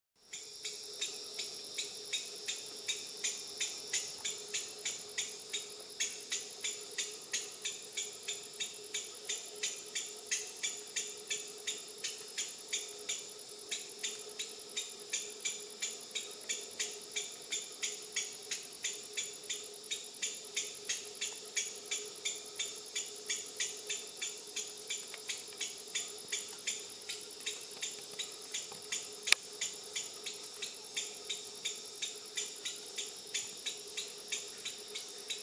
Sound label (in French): Alarme Guerlinguetus aestuans